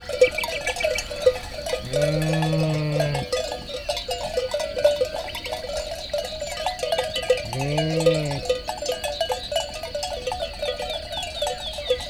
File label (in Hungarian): dombon legelő nyáj, juh; Egerszög